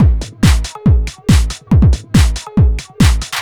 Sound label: TECHNO BEATS 140